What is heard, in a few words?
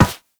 Run Metal, Footsteps; Warframe; Operator